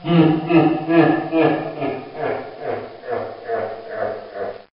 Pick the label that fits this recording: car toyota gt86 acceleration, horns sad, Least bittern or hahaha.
hahaha